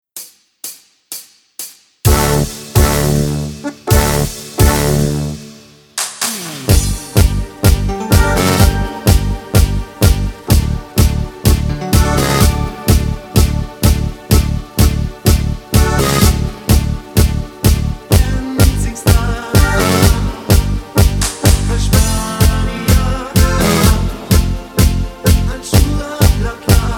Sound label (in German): ohne mit Chor